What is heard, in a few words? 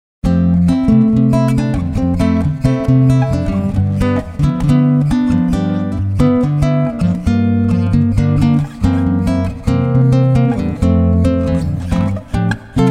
gtr